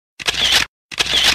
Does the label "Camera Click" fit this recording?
Yes